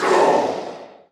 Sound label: Finalboss Vox Damage